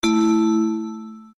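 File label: click on a big bell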